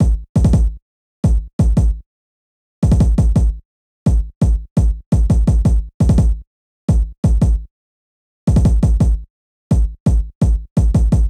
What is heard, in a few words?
Kick, 085 Bmin, Drum Tracks